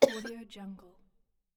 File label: female cough